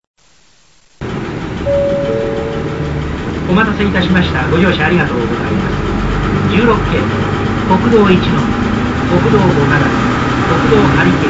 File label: bus tape orgel; kansai; narakotsu